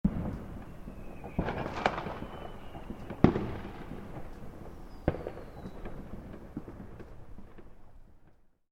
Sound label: Fireworks Exploding In Distance; Backgrounds; Firework Sound Effects; Fireworks (Sound Effects:Backgrounds); Stuart Duffield